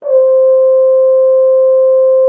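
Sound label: French horn C5; Xsample VOL-3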